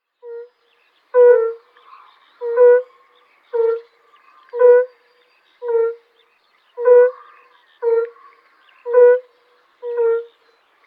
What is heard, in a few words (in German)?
Rotbauchunke Bombina bombina